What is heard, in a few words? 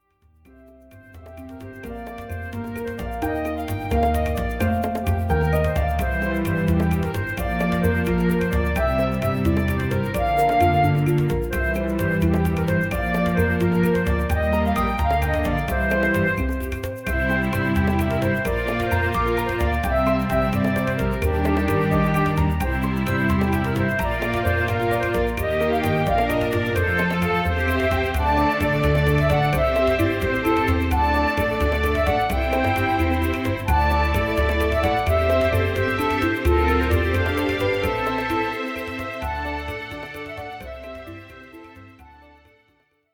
Irish Dance